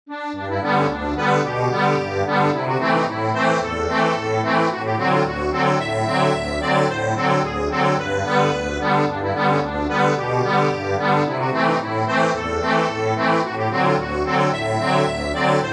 accordion, sheet music

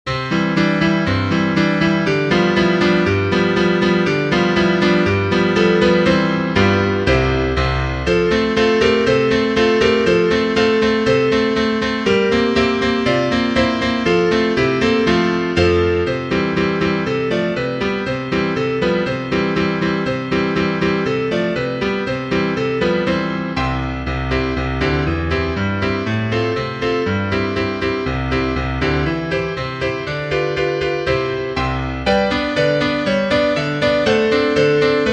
piano, sheet music

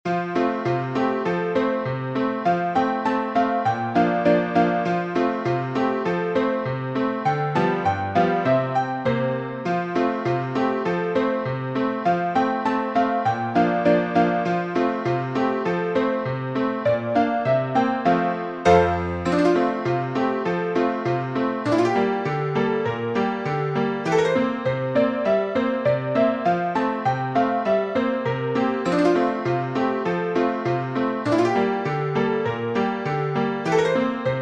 PIANO

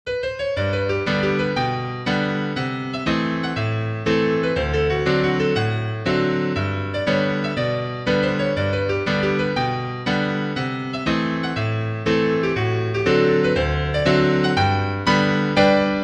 piano

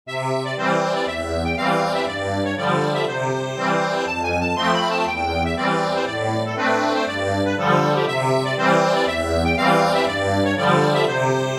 accordion

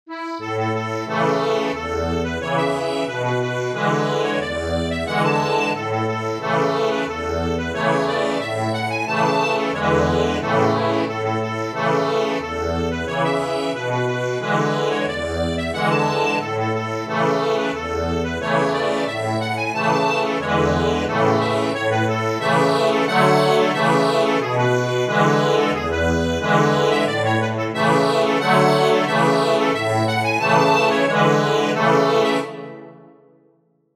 ACCORDION

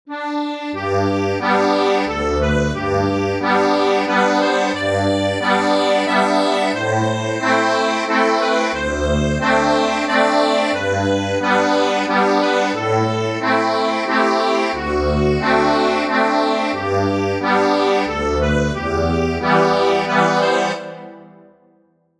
Away in a manger cradle song

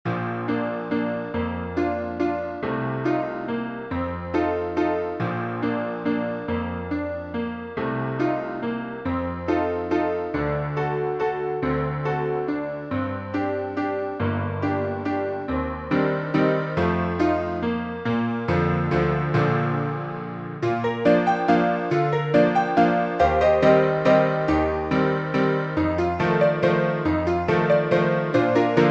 Waltz Hesitation PIANO

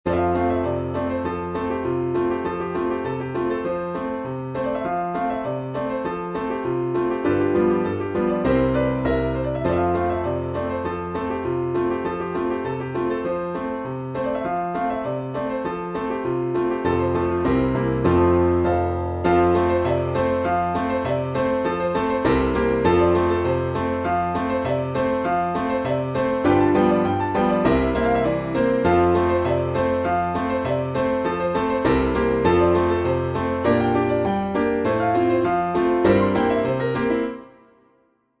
piano, sheet music

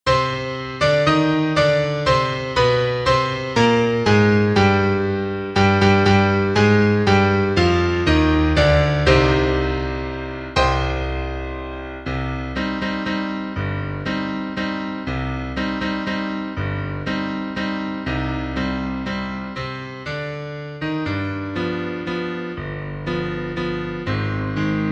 PIANO

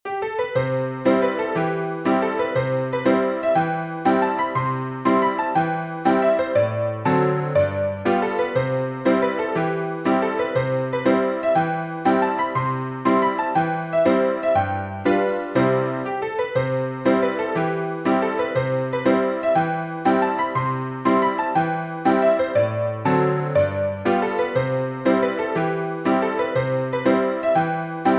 piano

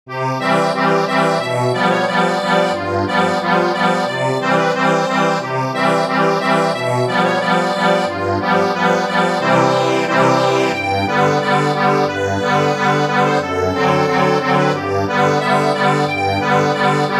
ACCORDION

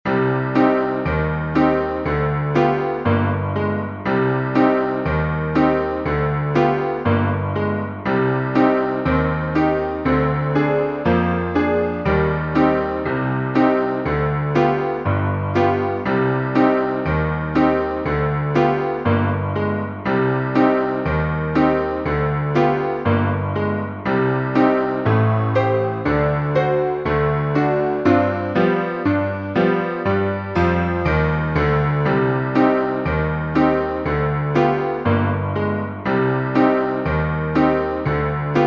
PIANO

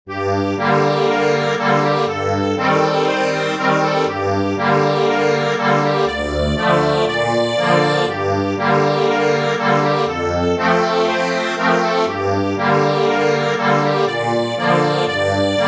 accordion, sheet music

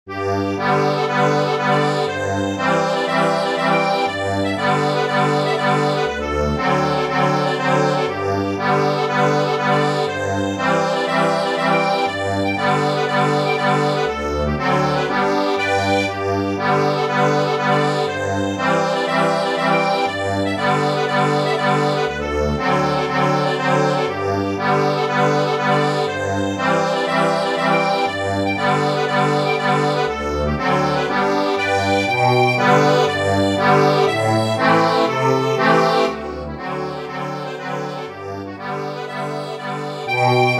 accordion

